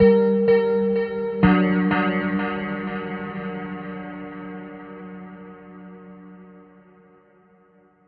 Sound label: bell echoes, synth and electronic loops